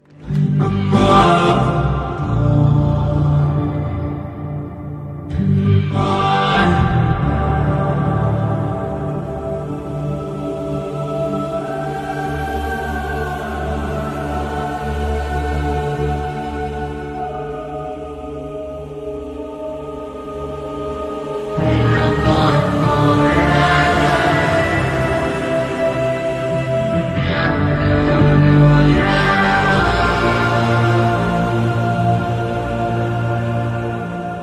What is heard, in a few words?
anthem ringtone